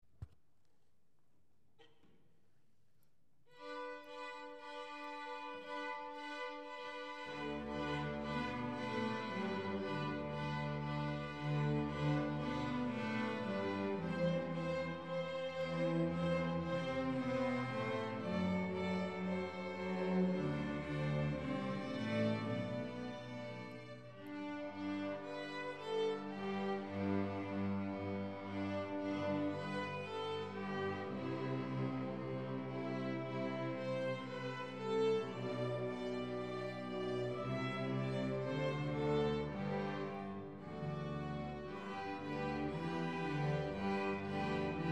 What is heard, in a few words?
Jingle Bells